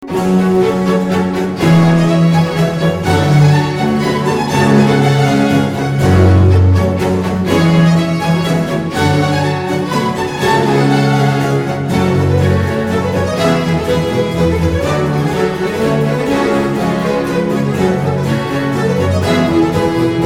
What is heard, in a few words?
passacaille; armide